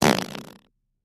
Close Up Fart

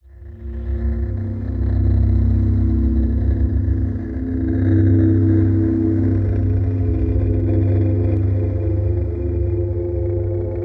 Orbit Fantasy Low Elect, eerie ambience

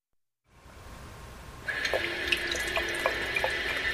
Water Fountain On Of

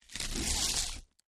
FLESH TEAR, gore

